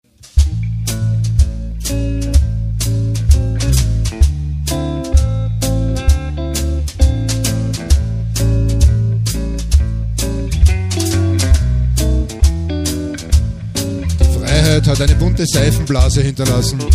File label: Jazz